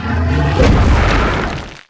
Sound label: attack